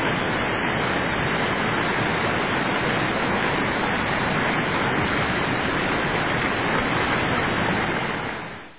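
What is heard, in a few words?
waterfall noisy distant muffled monotonous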